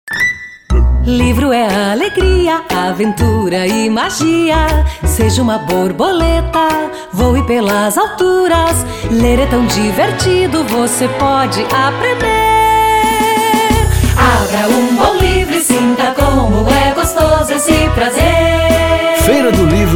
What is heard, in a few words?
jingle; FEIRADOLIVRO